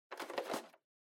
Portable Stereo Pick Up

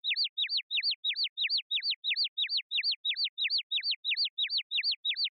Motion Dizzy Fast Loop

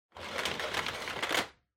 Vacuum Cleaner Cable Wind Up

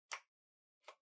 PC Keyboard Far Shift

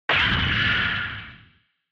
Retro Anime Robot Low Mid

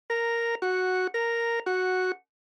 Ambulance Siren Fake High

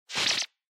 Motion Agility Double; モーション 俊敏; ダブル

